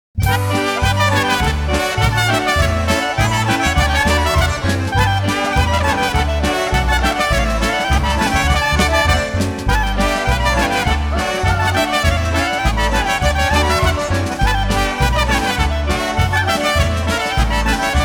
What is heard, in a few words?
Juke Box Cheer